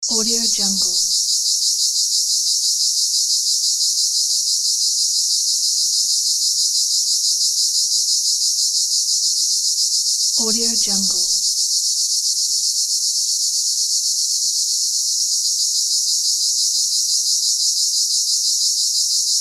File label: cicada ambience